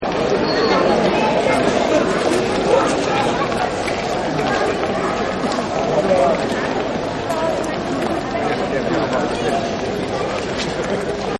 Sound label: Medium Walk Talk, crowd; Crowd Sound Effects